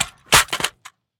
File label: riffle drop gravel; STALKER; IWP